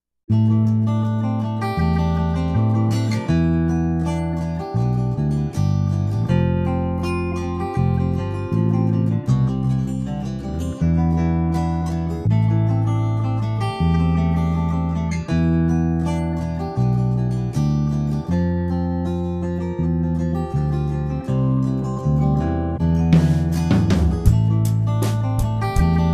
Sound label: ac guit